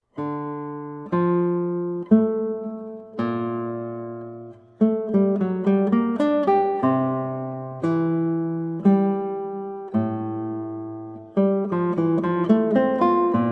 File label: Grave from Fantasia for Flute, Guitar; Fantasia 2 for Flute TWV 40 3; Georg Philip Telemann